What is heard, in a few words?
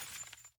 glass break